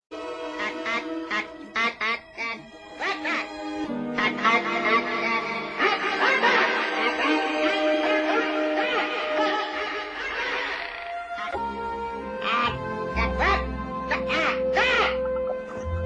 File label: ACK ACK ACK ACK